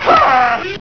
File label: grunt pain; hgrunt2